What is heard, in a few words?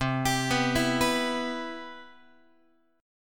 C Major 7th, Guitar; CM7 arpeggiated; JGuitar